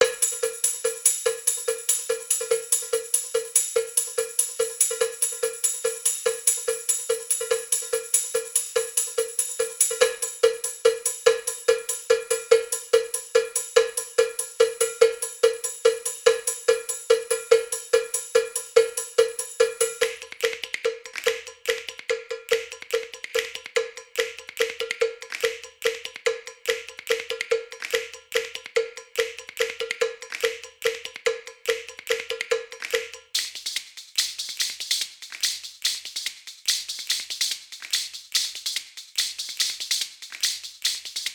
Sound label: PianoDestroy; The Adventures of Tintin: The Game; The Secret of the Unicorn; Xbox 360